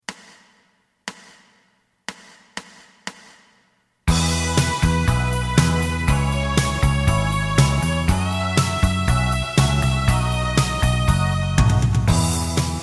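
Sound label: lead guitar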